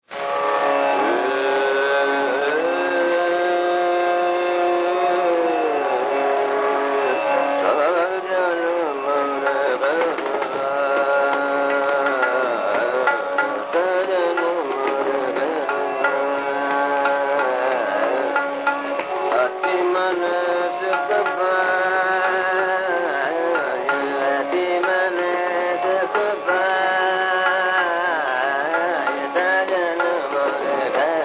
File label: jog